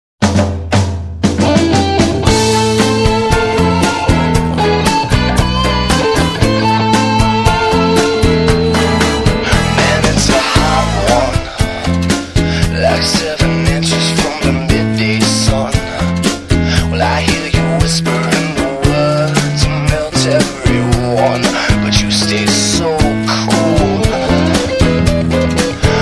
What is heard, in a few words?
Latin Dance Intro; D Player; Windows